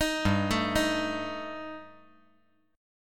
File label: G Suspended 2nd Sharp 5th G